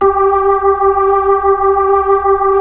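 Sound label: B-3, Hammond B-3 organ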